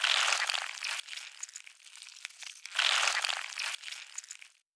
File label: snail